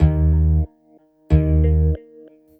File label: BASS, Hip-Hop & R&B; USB Soundscan vol.47; AKAI